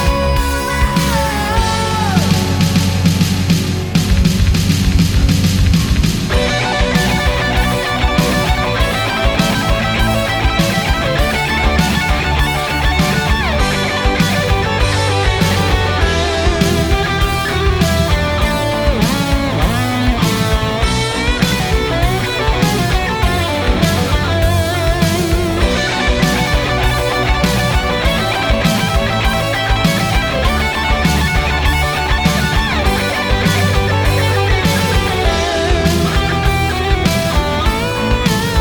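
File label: solo 100 bpm, gitara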